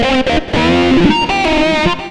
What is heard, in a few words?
xtra lead, Leads; Sonic Foundry (Sony Creative Software); Ugly Remnants VOL-2